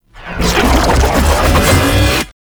Medic Aoe Wave Cast; Abilities; Warframe; Tau; Dax Medic

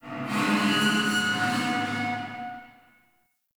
Galleon Metal Screech; Warframe; Grineer Galleon

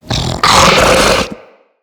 Infested Ancient Run Breathe; Enemies

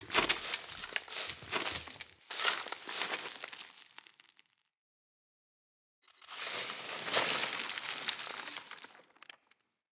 Acorn Digging Foley; Cinematics; Bromius; Bromius Quest Acorn

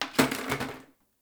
Janitor Plastic Bottle Water Drop; Warframe; Ambience; NineteenNinetyNine

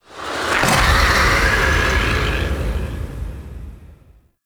Exterior Sky Screech; Ambience; Duviri

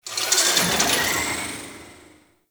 Distant Machines; Ambience; Warframe; Corpus Railjack

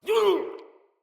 Death, Soldier Male; Dendrit

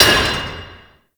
Worker Hammer Impact Metal; Warframe; Grineer

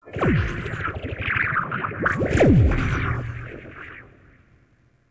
Ship Laser Whip; Warframe; Corpus Ship Remaster

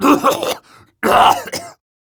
Coughing; Stoic; Warframe; Evacuation

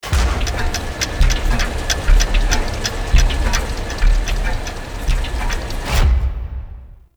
Misc Machines; Ambience; Warframe; Grineer; Asteroid

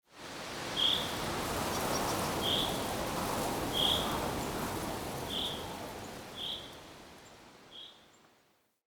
Wetland Forest Day Wind Gust Relaxed; Midrath Island; Ambience

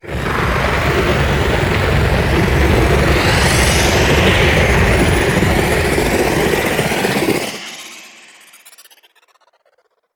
Extraction Depart; Blue Sky Liset; Warframe; Vehicles; Liset Flight

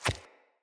Pack Wolf Step Single; Animals; Footsteps

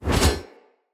Split Sword Whoosh Heavy; Weapons; Player; Swords; Starter

